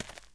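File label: walk right, dirt foot; The Elder Scrolls III - Morrowind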